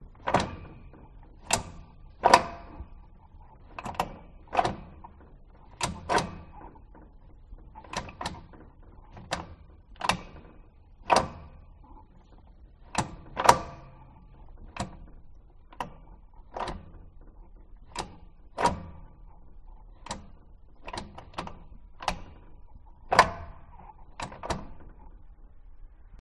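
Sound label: exterior shift linkage; detunized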